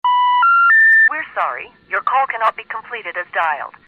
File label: phone error